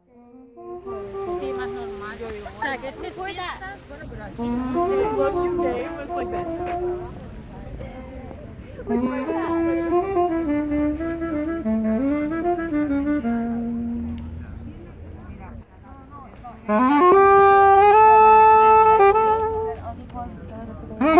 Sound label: sax